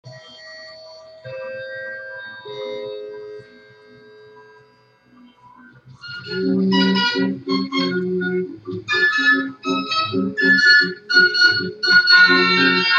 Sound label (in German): Orgel